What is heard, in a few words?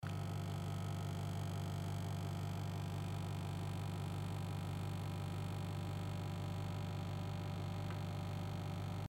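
vibration sound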